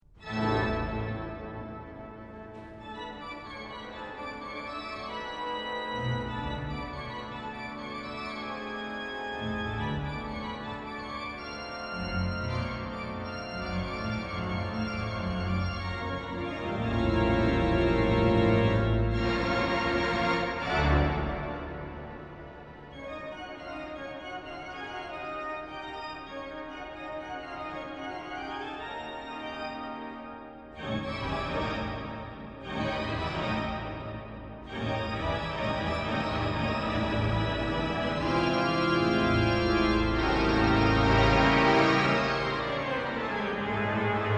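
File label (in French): verset, orgue; Improvisation verset n°10; buffet d'orgue de la cathédrale de SAINT-DENIS Cavaillé-Coll, 1841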